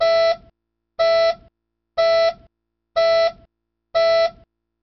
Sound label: Siren